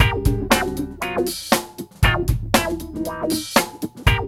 LOOP

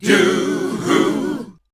Voice Spectral, H PHRS; Best Service; Voice Spectral II

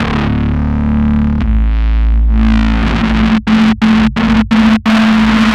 173 Bass, Drum n Bass

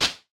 CLAP, Vermona Mono15 Drums; blend with snare; Stereo layers

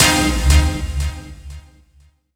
C4, Synth Line; 90 s Sample CDs; NiceBeats; SynthLine VOL-7

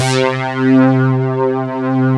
TECHNO; Pro-Rec - Synthsonic; Z1 TECHNO

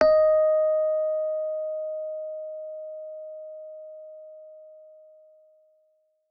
Rhodes forte d#5; Session Instruments

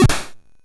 Drums, Hits; DCM8

